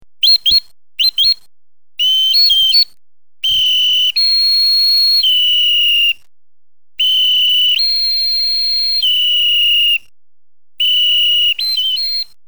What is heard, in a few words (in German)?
Wecken